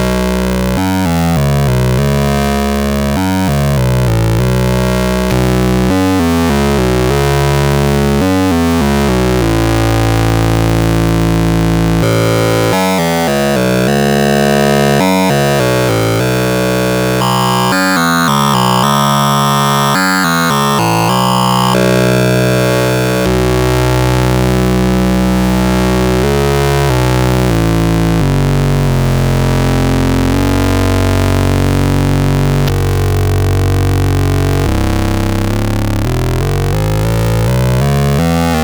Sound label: cyclebox sinepulse saw varying ph2